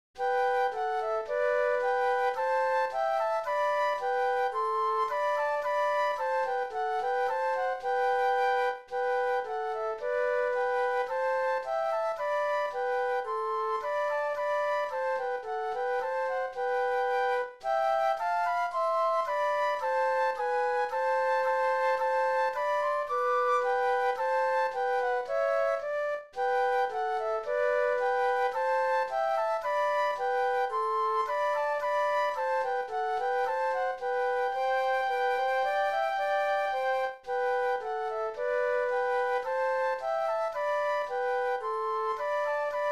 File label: Flute Duet